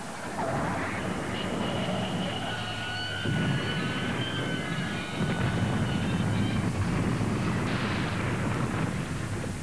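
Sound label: TRAIN CROSSING; 10,000 Graphics Pack Volume 1; Media Graphics International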